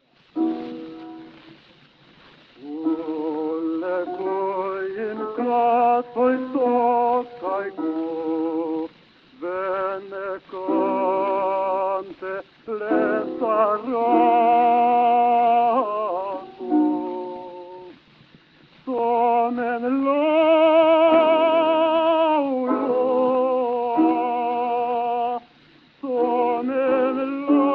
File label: Gramophone